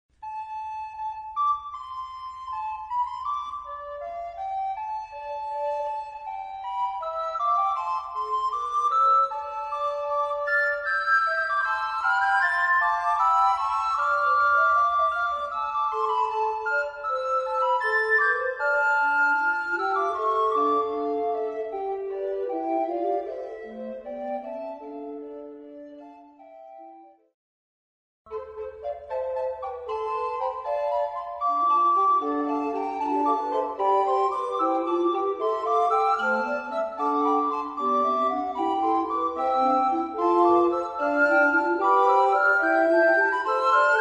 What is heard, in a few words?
canzon recorder; scheidt; Canzon: O, Nachbar Roland